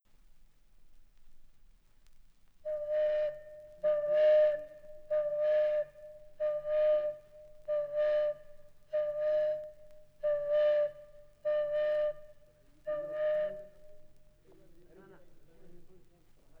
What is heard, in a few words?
flute